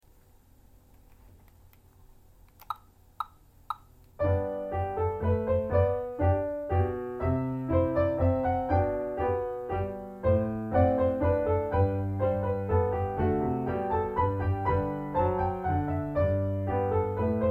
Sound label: menuet in g major together